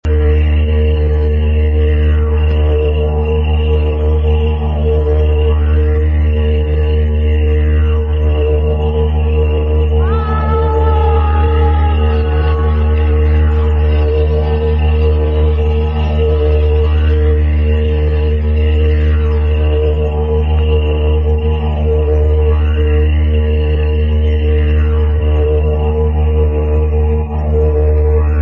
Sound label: didgeridoo